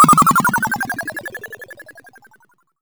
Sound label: Zoom Down, 8-Bit; Games And 8-Bit Kit Volume2; atomsplitteraudio; chiptune